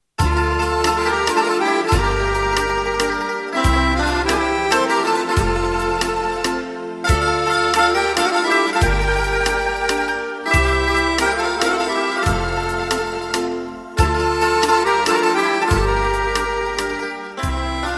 Ludo Mlado, Bulgarie

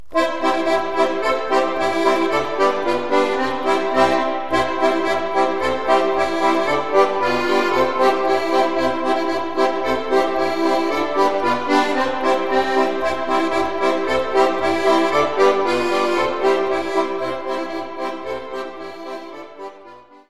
POLKA - Belgique; Polka d'Elisabeth Melchior

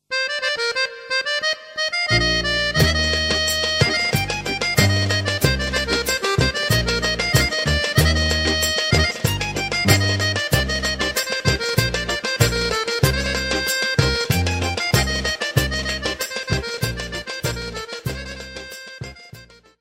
Zensko za raka, Macedoine

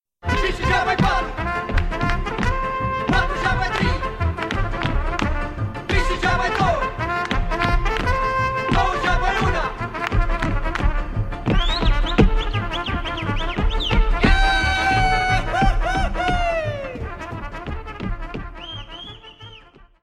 Corobeasca - Roumanie

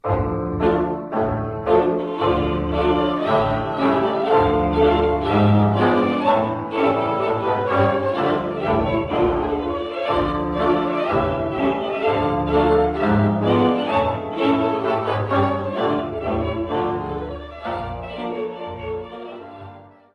Verbunk, Hongrie

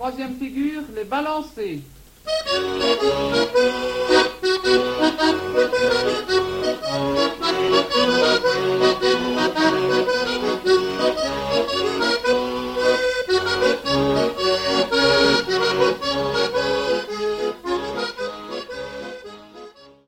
Quadrille, Belgique

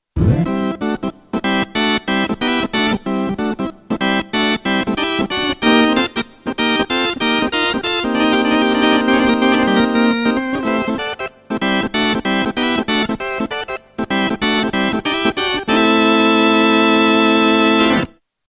orgue electronique; instruments

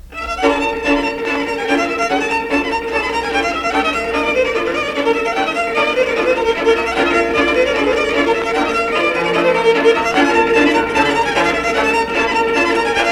BATUTA, Roumanie, Republique-Moldave

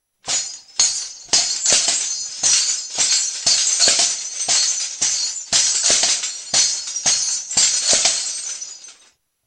chapeau chinois; instruments

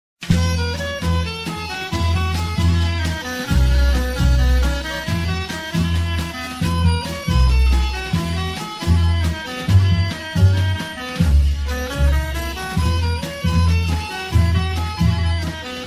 Bulgarie; Vaklaj Vaklaj

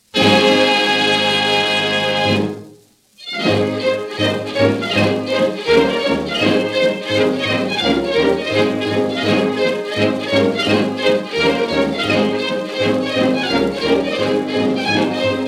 Reel - Ecosse

